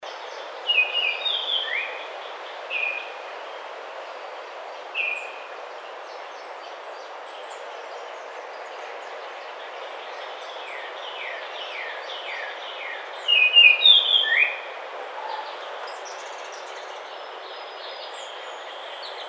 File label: Baltimore Oriole